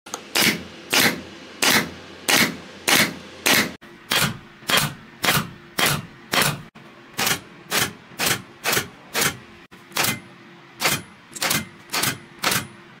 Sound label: nail gun; میخکوب